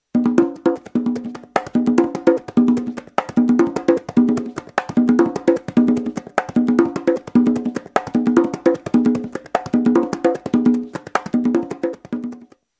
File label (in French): instruments conga